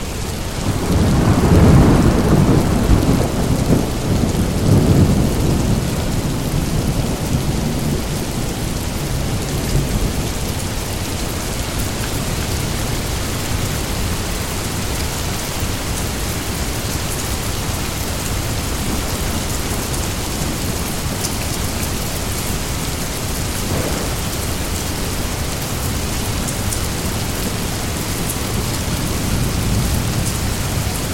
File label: The Rainstorm